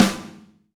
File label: Rock Snare, Roland BK-5; Snares